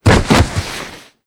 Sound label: bodyfall